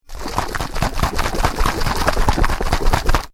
food cream can aerosol shake, Aerosol Whipped Cream Can Shake; Shaking; Objects; McKinney Sound